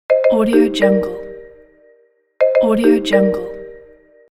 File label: ringtone